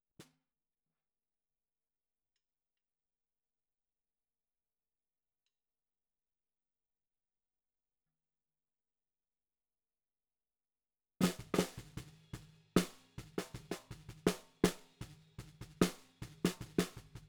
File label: SNR B